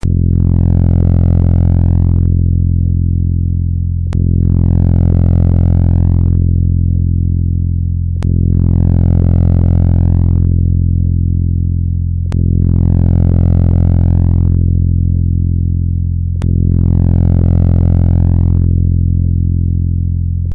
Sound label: Kepler Star Light Curve Waves to Sound